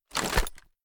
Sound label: raise, reloads; gmod